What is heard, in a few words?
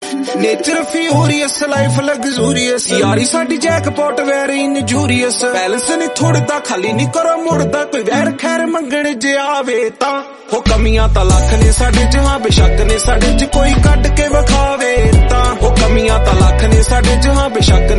punjabi ringtone